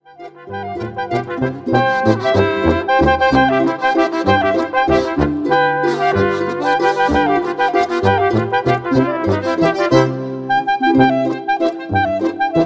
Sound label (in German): Walzer; Stubalm